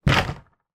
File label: foley storage box plastic bash into